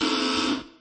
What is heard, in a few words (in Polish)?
DOMOFON; Sniper: Path of Vengeance